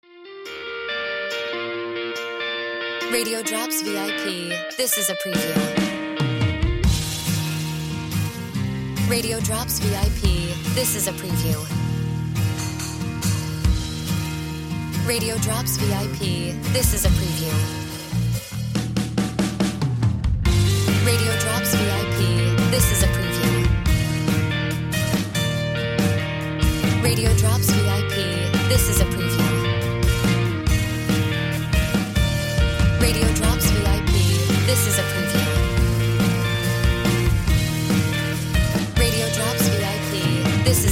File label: Music Bed